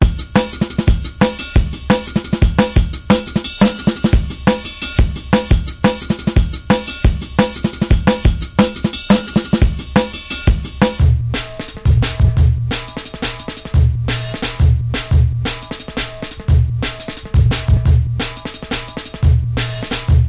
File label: drum n bass licks; DnB; Alaska and Paradox Sample Me DnB